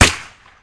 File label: gun